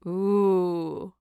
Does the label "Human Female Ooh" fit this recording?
Yes